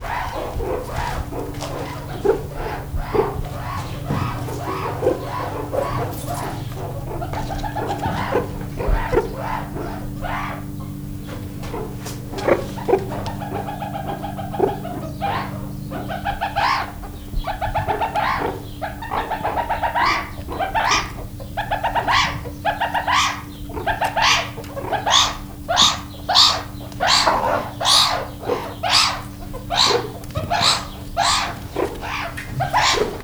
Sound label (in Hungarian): egyre hangosabban, szurikáta; debreceni zoo 2012; marantz puska